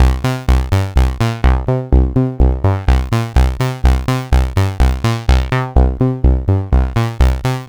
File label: Choral saw C 125, Synth Loops, 125bpm; Zero-G; Soundclash; Nu Dance Electro